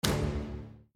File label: robber place